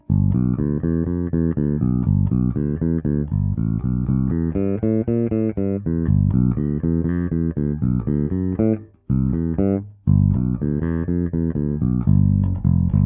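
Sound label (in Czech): H-H prsty, poniklovaná ocel, Roundwoundy, Schecter SLS Elite-5 baskytara; Dunlop DBM 45 - 125 Extra Long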